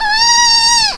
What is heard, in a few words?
Baby